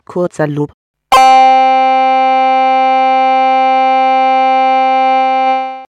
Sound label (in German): Kurzer Loop